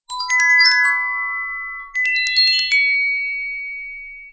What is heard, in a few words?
Glocs